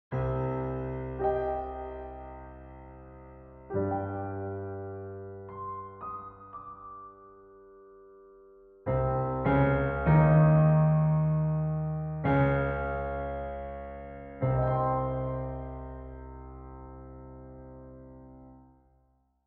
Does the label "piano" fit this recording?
Yes